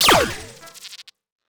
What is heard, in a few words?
Blaster Shot; Digital Rain Lab